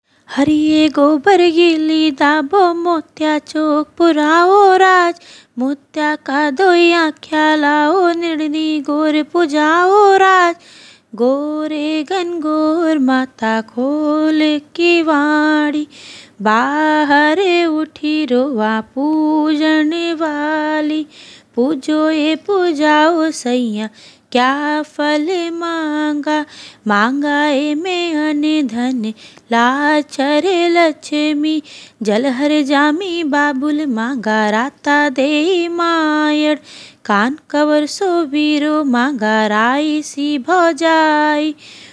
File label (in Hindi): हरिये गोबर, गीत; सबेर का गीत; गनगोर गीत